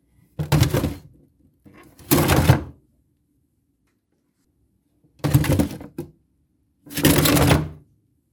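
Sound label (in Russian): выдвижные отсеки в холодильнике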